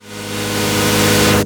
Sweeps Medium

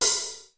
HAT, SR16; musicradar-synth-samples; Classic drum machines

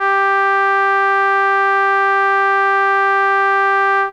Horn G4, ARP Solina, Unmodulated; musicradar-synth-samples

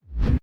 BD Gate FX, Bassdrums; Vengeance Samples

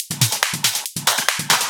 Loop 140 BPM; vengeance essential club sounds-3

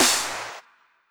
Clap; Vengeance Samples; VEC1 Claps

